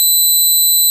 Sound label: Pick Bass C6